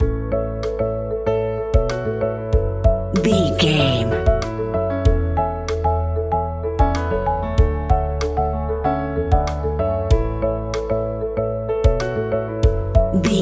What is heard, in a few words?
happy day, Pop Rock; Happy Days Pop Rock 60 Sec; Catch 22 Music Network